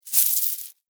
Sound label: Insect Hiss Angry, Insect, Hiss, Angry, Bug; Insect Hissing; Blastwave; Animals:Insects